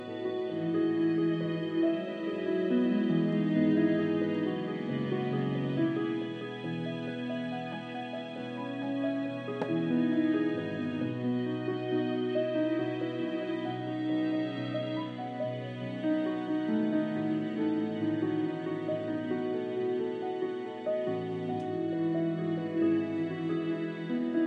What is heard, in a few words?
early strings